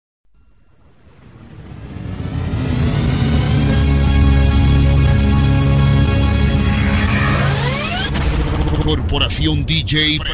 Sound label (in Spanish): bachata mix